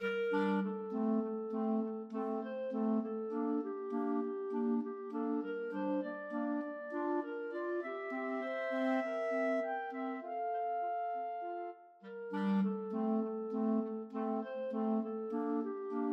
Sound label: idylle clarinet trio